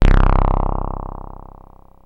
Access Virus B; Synthworx

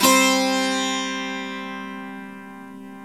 CHIKARI, Indian Ensemble

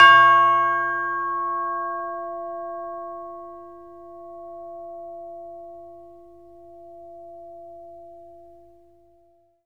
CHIME D#5; ILIO; Synclavier Percussion 2; World & Orchestral

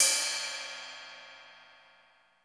DRY CY RD; Crypton; DrumBase5000

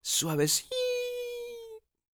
YELP, World Voices, ANDES FX; Spectrasonics Vocal Planet

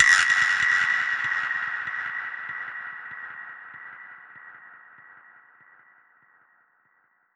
Perc Hit, dub percussion; musicradar

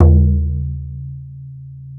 Bodhran Hi

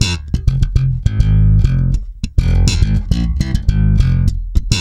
F, hard funk, bass; Spectrasonics; Bass Legends

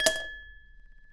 ICE, household; AKAI Anime Sound FX

